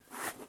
Friction Moving Object

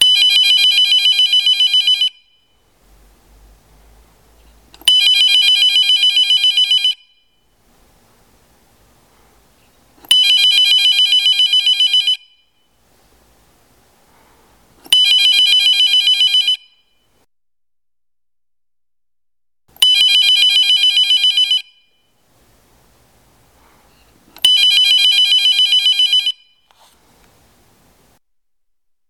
Electronic

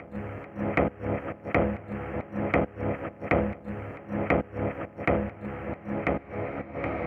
Deep House Tech Loop

